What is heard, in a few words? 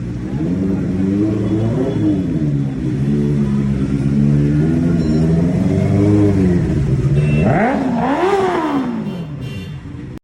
Benelli exhaust